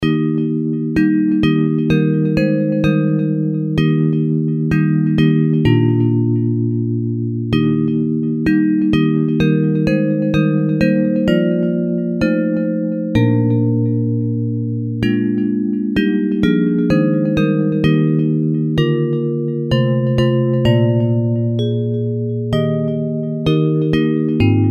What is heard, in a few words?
Bells, Hymns; Break Thou the Bread of Life